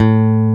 Fingered Bass A0

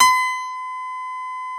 Piano Ab3

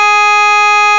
Reed Wave C3

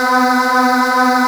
Aahs Eb2

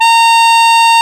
Accordion Bb3

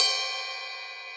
Ride Bell

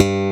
Slap Bass 1 A0

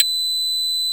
Pizz Violin C6

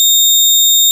Octave Wave C6

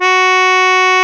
Accordion Gb2

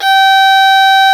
Violin C4

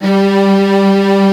Strings B1

